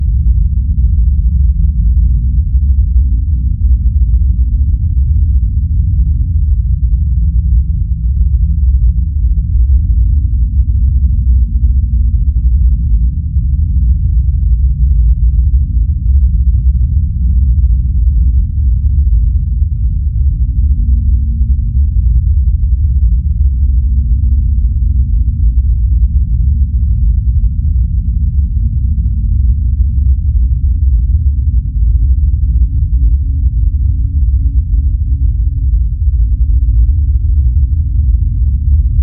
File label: Ominous Bassy Rumble Drone